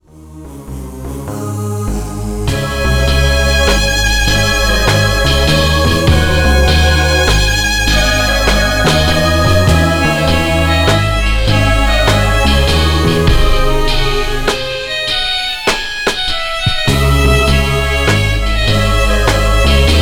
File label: violin concerto 2nd movement; Bach’s Violin Concerto No.2 2nd movement BWV 1042